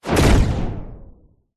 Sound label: science fiction impact bullet hit incoming whoosh thud